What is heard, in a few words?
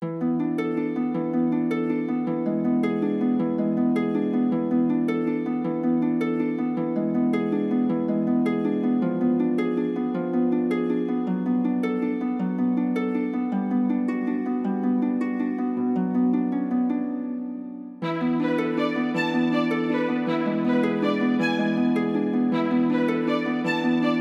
celestial harp melody